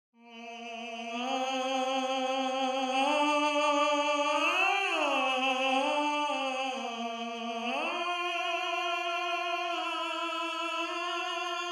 Tenor, counterpoint

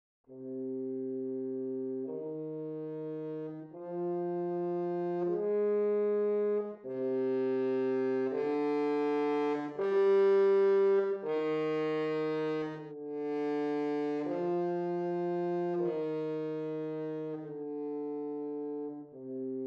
Horn

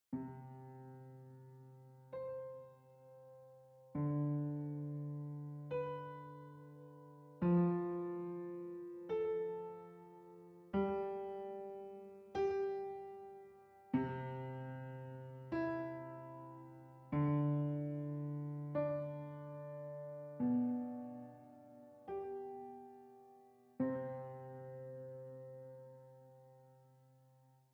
Piano

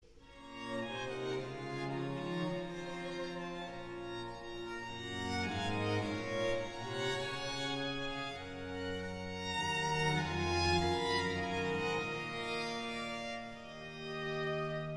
Ritornello; Monteverdi; L Orfeo; Early italian opera

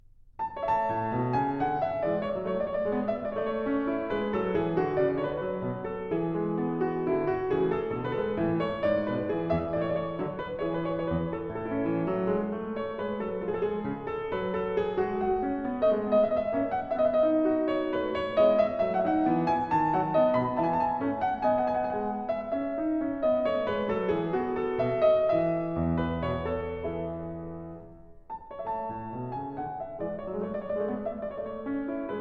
English Suite in A-dur Courante; Bach; BWV 806

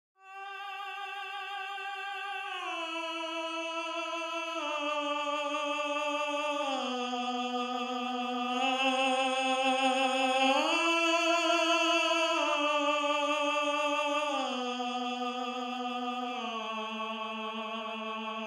Tenor

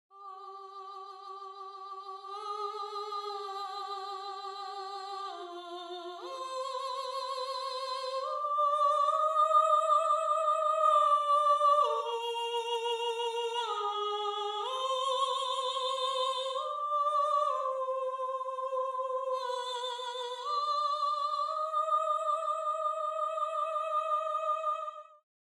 Soprano

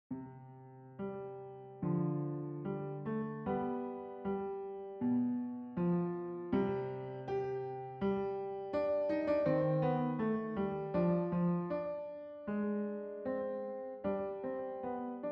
Piano

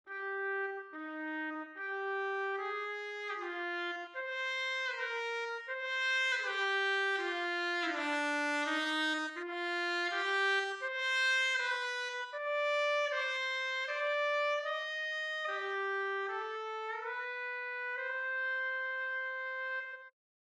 Trumpet

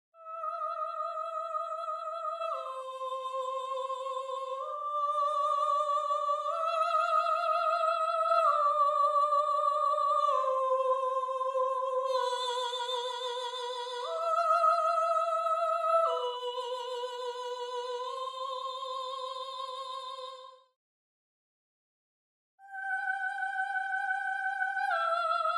Soprano, counterpoint

